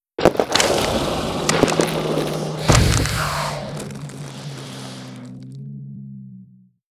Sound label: swarm queen death; Stellaris; other entities